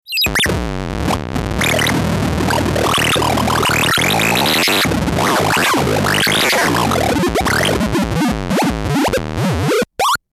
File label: bleep tone